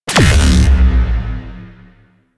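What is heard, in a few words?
Grenade Launcher Projectile Explosion; Warframe; Weapons; ThanoTech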